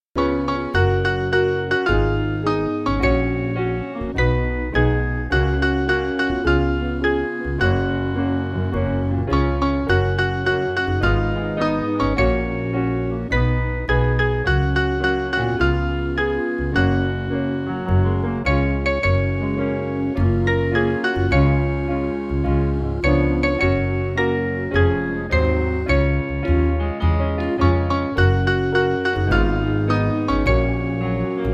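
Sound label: Piano, Music Backing Files for Churches; Mainly Piano (CM)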